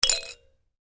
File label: toy musical shaker; Miscellaneous Toy; Toys; McKinney Sound